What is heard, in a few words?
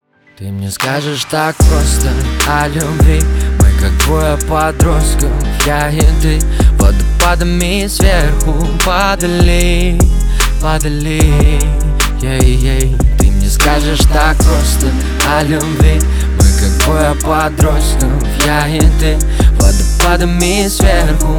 solo